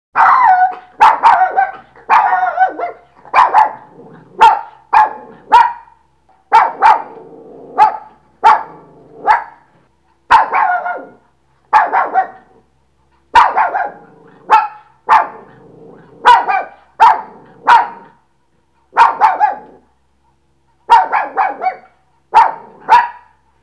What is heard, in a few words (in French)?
chien